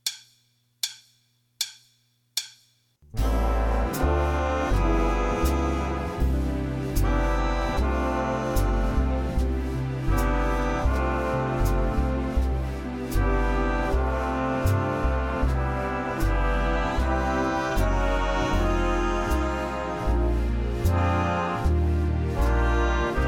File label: last set winds cut